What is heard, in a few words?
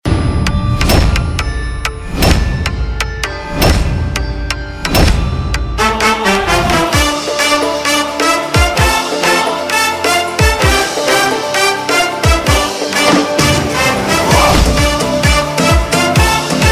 Rock Music Ringtone; Bollywood; Dilwale